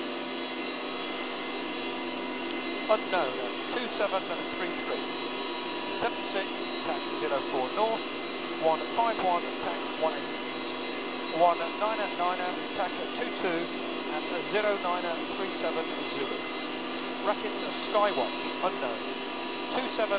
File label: noisy